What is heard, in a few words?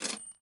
Grab Key